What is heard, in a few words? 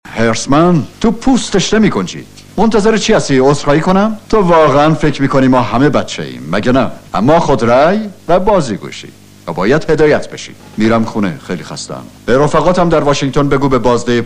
music